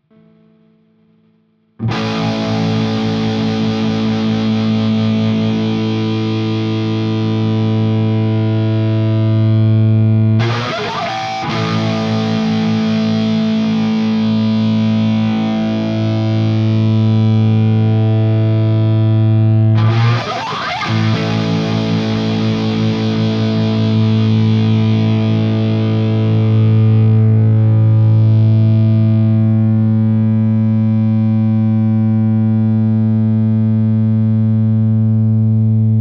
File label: el guitar